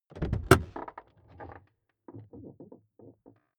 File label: car door open interior hummer h3